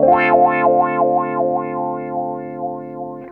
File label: WOWOWA, Funk Guitar; Zero G - Funk Guitar